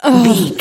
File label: voice female expression emote sigh